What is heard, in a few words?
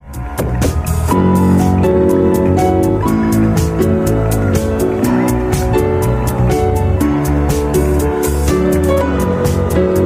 piano ringtone